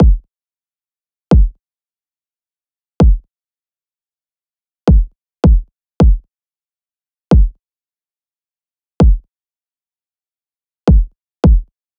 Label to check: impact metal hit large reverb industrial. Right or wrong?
Wrong